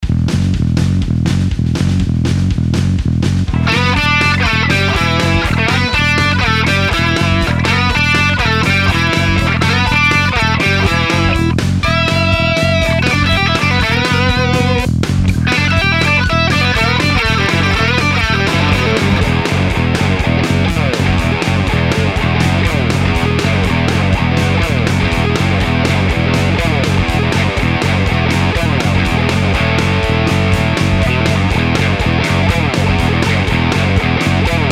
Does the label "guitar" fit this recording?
Yes